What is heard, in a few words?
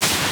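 hawaiian sd, hawaiian snare drum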